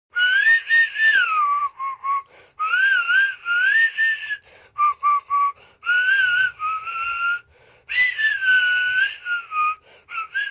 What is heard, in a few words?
whistled